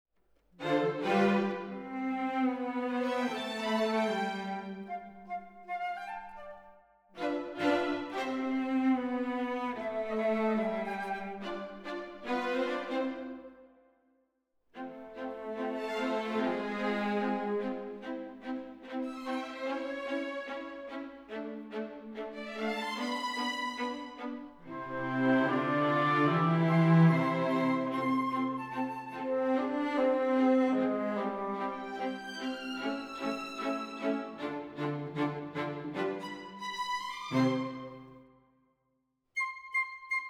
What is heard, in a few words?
Orchetra